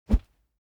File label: foley whoosh bamboo slow; smartsoundfx